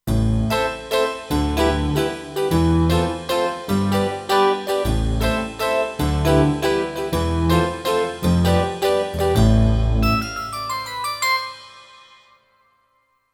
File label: Pluck Stack, Kurzweil K2500X